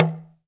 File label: TALK DRM, Heart of Africa; Spectrasonics